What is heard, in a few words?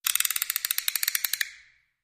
Thumb Screw Turns, gears